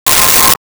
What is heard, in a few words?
Sci Fi Beep